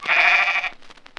sheep; Animals

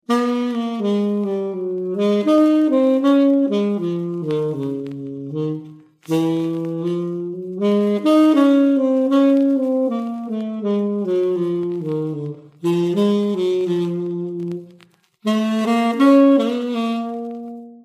saxy trip

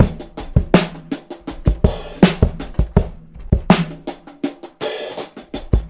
Drum loops, Boombap

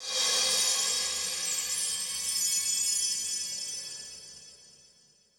chimes; Misc Percussion

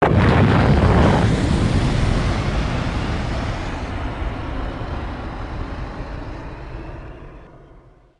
explosion; Crash Bang Boom

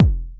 Kicks Medium

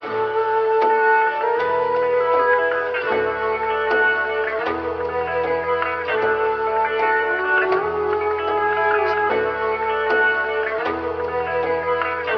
flute, Jazzmatazz loops

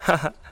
ha ha

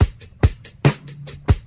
Drum loops, Boombap

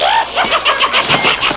laugh demon; ooh ahh

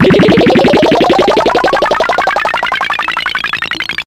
powered up; Zaps and Beeps

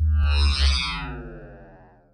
warp, zaps and beeps

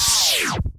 acid bath, zaps and beeps

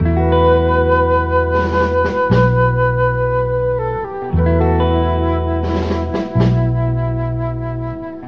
flute, Loops

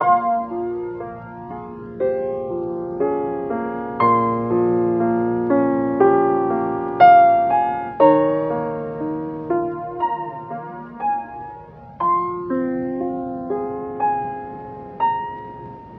piano, Loops Sad

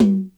Toms, Real

